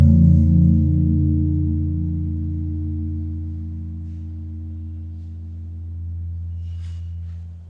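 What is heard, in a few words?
gong single gentle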